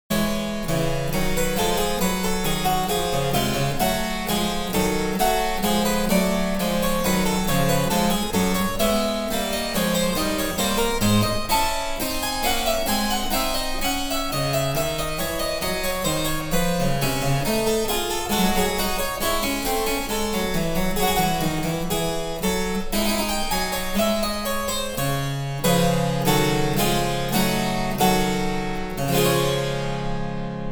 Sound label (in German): Cembalo; Mark Lindley WTC 1